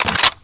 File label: bolt backward, weapons; dod 1.3; dod enhancement pack